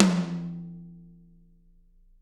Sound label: TT, Toms; Bob Clearmountain Drums I